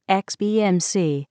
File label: notification; xbmc